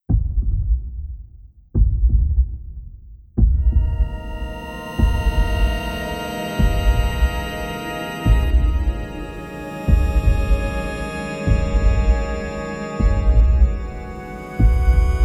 Karaoke version; Kara no Shoujo

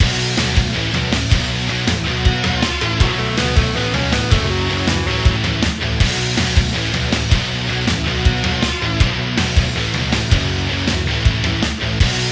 3d Music

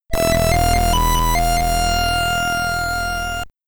Stage Clear, SID Stereo; Izanai; Ninja Spirit; commodore 64

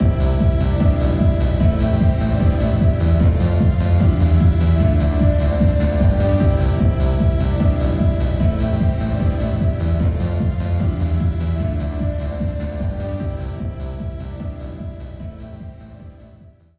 Jingle Bells ~ Ambient version; yakuza xmas sides ps2 ps3 ps4 windows xbox one gamerip 2005

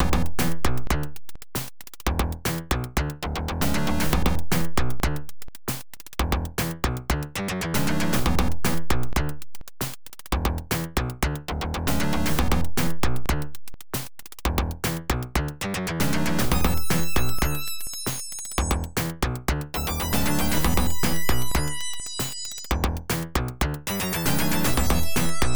Tension; Adventure Tsukuru 98; PC-98

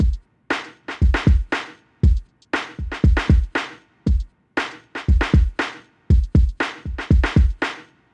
90 hiphop 118bpm 4 bars; hot lava; ios macos windows

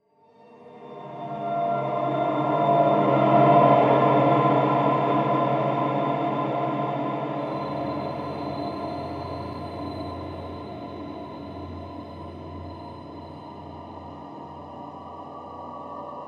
menu music; soma

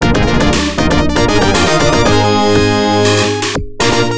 Last Round Clear; U.N. Squadron; arcade

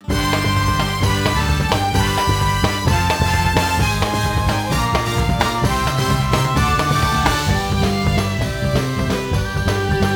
Horse Racing Goal; mario sports superstars 2017 3ds gamerip

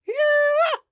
attack

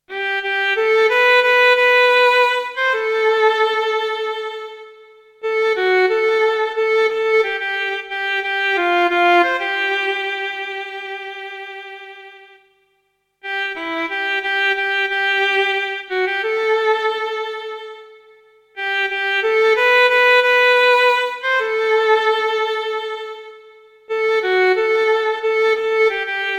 violin version; Requiem

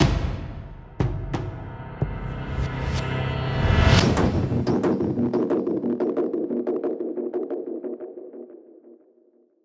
Transition; entwined; ps vita

